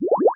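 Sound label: bubble; The Wild Thornberrys Movie; buborek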